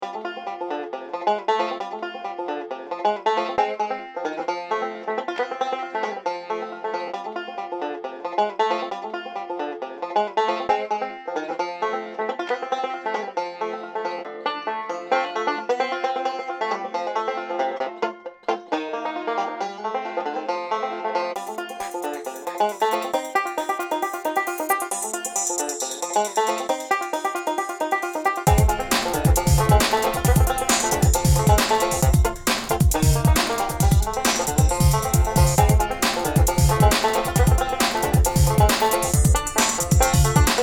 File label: country bounce